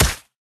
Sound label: Barbarians Wyvern Death